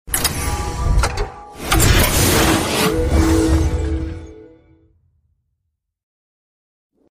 lock

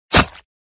sound attack hit; hitsound

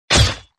sound attack hit; hitsound

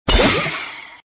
skill bullet; ghostfire

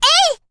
attack

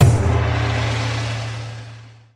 click; Hunter

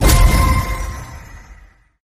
skill bullet; funerary buddha

